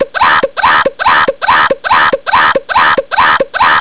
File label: chicken cannon